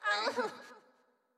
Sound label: Wimp Hurt